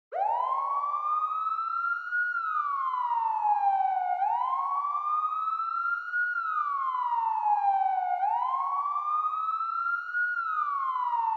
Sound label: Police Car Siren Far